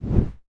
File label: woosh; outfly